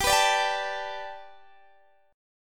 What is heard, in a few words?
G Major Add 9th Guitar DADGAD, chord